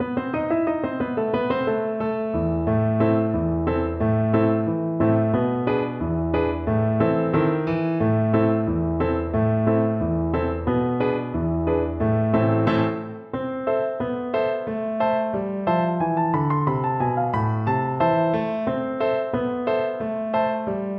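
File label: tantz VLC karaoke, Cello; broyges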